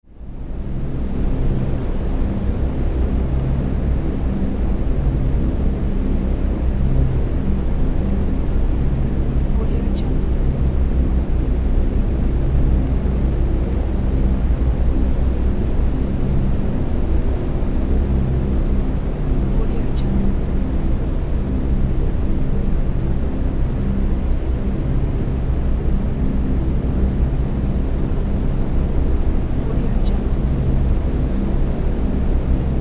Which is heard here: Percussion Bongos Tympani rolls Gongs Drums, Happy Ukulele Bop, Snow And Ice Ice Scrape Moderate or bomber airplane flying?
bomber airplane flying